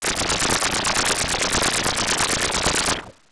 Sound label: lasergun